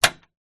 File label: household bolt metal gate movement